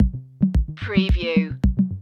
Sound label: satellites stinger